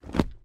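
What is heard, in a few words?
falls of a human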